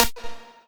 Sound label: lead